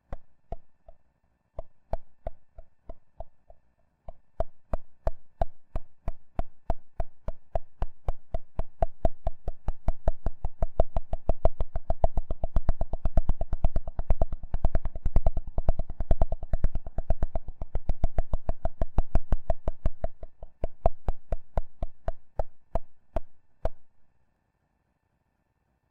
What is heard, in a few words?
ticking